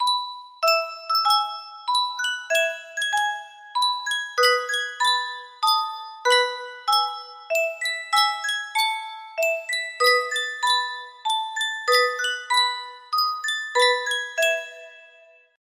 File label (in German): Sankyo Spieluhr